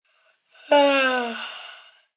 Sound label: Yawn Short, Gasp, Breath, 1 boy, yawn, sigh, human voice, kid, child; Gasping Sound Effects; Gasping (Sound Effects:Voice); VoiceBox; Twistedtracks